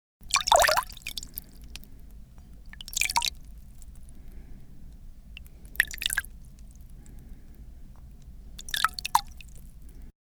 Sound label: bowl water